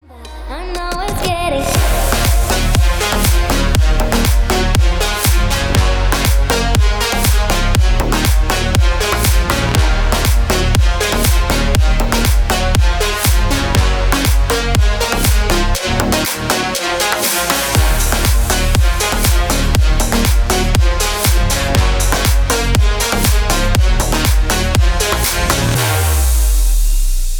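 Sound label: loud hit samba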